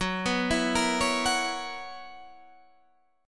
B Major 7th Suspended 2nd Suspended 4th F sharp, chord shape